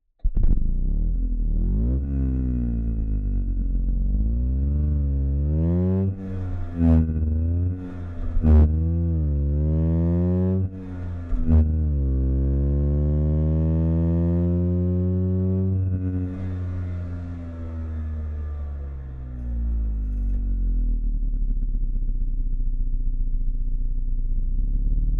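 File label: Ape P703V, Onboard, Start, Fast Drive, Stop, Reverse, Stop, Drive, Stop, Off, Engine Intake; Piaggio Ape tm P703V 1993; Pole Position; DPA4062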